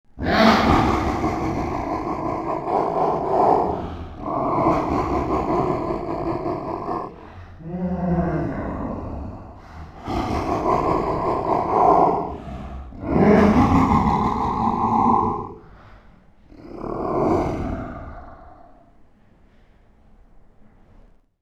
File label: laugh deep bad guy